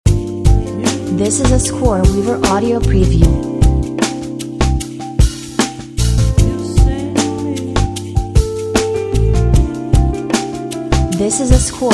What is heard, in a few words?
coffee jazz lounge full length with vocals